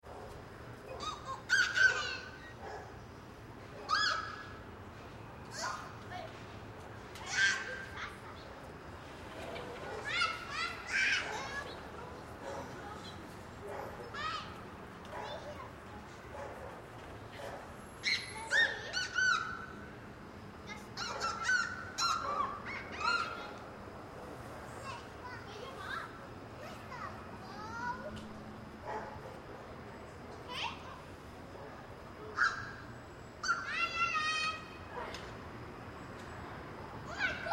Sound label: Crowd Kids Play Co-Op Park Light; Crowds